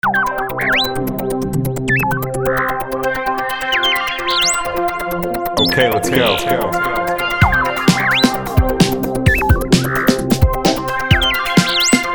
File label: Digital Bird Ringtone